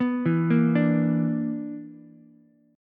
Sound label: B-Minor Major 7th Double Flat 5th B down Ukulele; BmM7bb5 arpeggiated; JGuitar